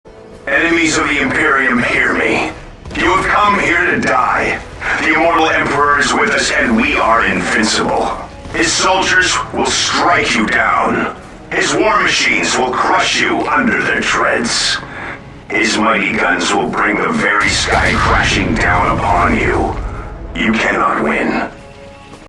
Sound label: chant